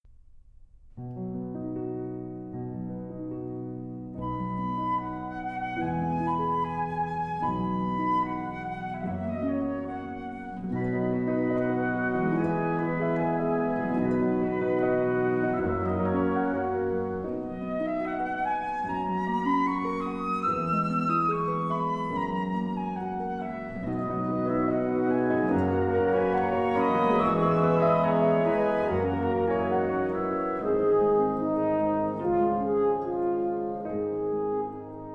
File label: TIMPANI